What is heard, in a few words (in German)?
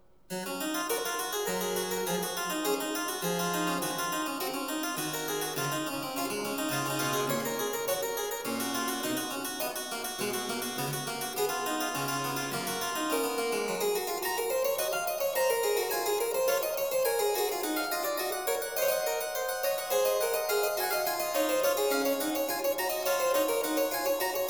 Variationen; J S Bach; Goldberg; BWV 988; Variatio 5 a 1 ovvero 2 Clav．